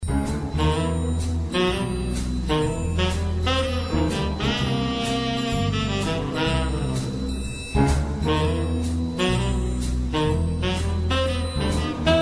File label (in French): saxophone extrait